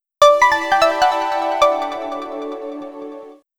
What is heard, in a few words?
shutdown; Samsung SPH-M500; Startup and Shutdown